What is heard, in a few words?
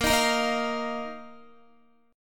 A sharp Suspended 4th A sharp, Guitar; strummed; A#sus4; JGuitar